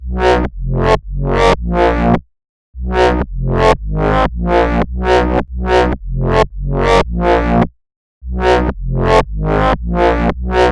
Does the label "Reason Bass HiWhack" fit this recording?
Yes